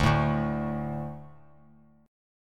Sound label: D 5th D, down; D5 strummed; JGuitar